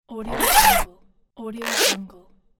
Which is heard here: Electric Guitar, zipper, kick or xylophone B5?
zipper